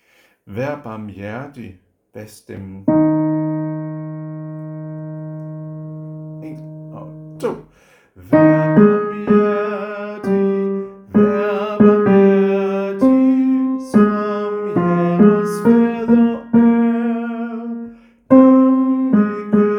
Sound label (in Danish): bas; harlev koret